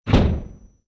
mech step; Sid Meier's Civilization 4 Complete; Beyond the Sword; Units

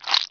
leech attack; Emperor - Battle for Dune

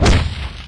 strider step; Garry's Mod; hl2

